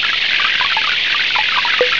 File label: 2500 bubs per second with noise; Titan